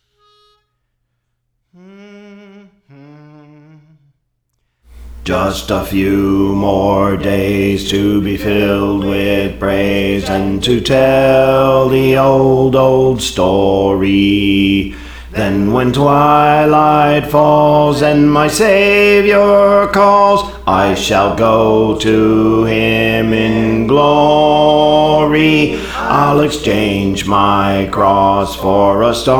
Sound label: Just a Few More Days, Bass; Praise For The Lord Training